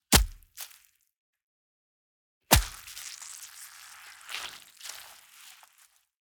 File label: Gore Splat Splatter; Just Gore 2; soundbits